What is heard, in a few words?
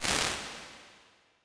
Vocal Ballad, Chambers and Large Rooms; Ableton; Convolution Reverb